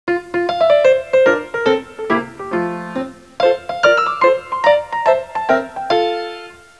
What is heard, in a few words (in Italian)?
suonerie classica; musica classical